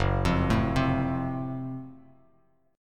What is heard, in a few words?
Gb Minor Major 7th Flat 5th, Bass Standard, chord; arpeggiated; 2,8,7,5; JGuitar